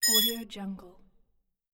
alarm

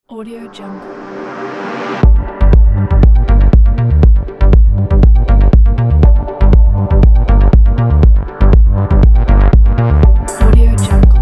deep techno

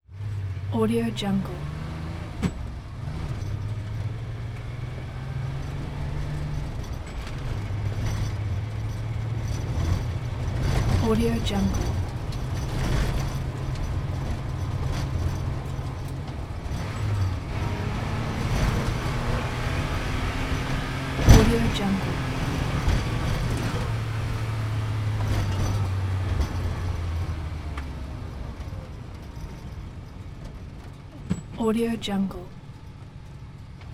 sports car inside during a race

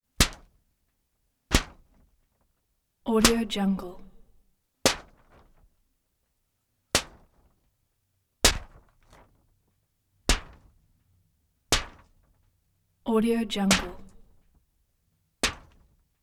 paper hits large sheet doubled up taps

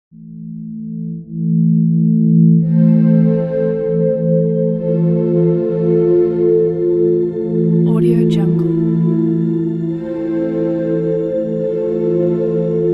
ambient pulsing background

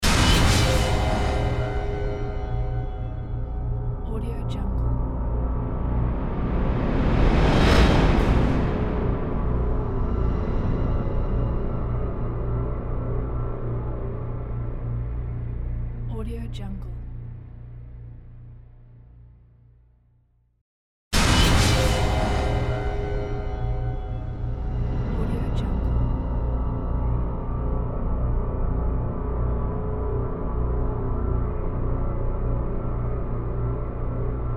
dark intro logo

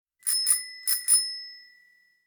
bicycle bell; prosoundfx

